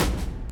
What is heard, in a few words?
Orchestral Percussion